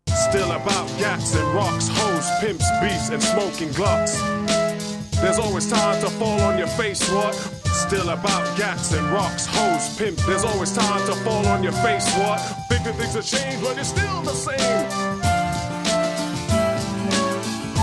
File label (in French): classique pop